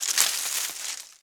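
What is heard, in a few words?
bush movement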